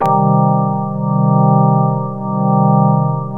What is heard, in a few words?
PERCOR, Proteus; Drums